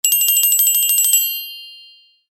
Tinkle; Nokia; 2630; Ringtones; 2630 Alt 2

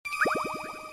open

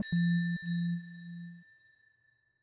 Wind Chime; Calm; Samsung Galaxy S26 Ultra

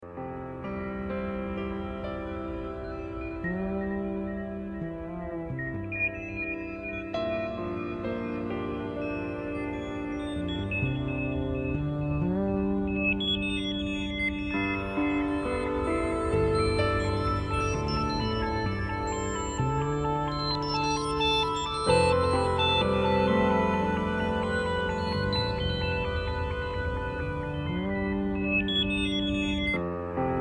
Morning, alarms; Tinno ATT Calypso 3

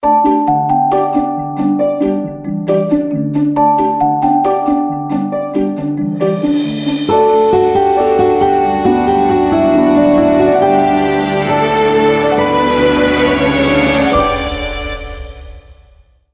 Tune Orchestral, ringtones; Huawei Honor 6

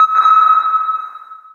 Notifier Sonar, BlackBerry; BlackBerry 9000-Bold

